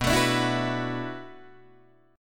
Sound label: B Minor 11th B down Guitar DADGAD; strummed